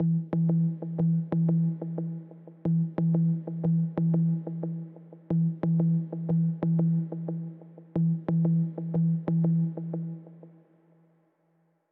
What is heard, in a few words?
pluck; one dog story